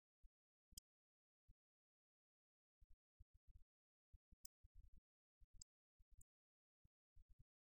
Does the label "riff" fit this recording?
No